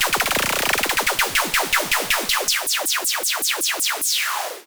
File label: Yamaha CS5, Filtered Noise